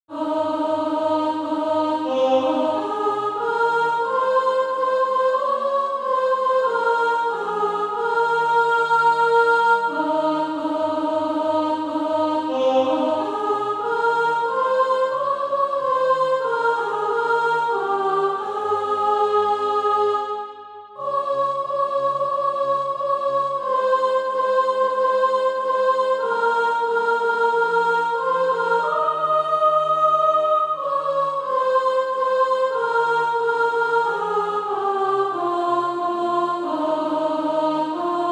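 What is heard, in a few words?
soprano, Practise Music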